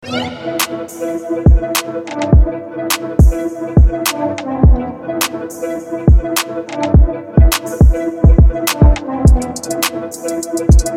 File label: Hip Hop; Juvenille